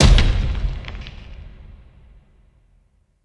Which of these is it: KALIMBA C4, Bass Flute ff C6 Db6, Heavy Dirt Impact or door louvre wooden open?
Heavy Dirt Impact